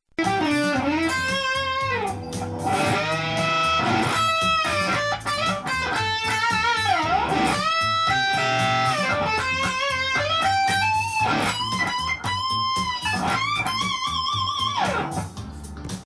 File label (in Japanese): sutera efekuta